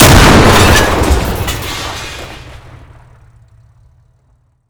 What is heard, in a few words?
Projectile Sounds; Red Orchestra 41-45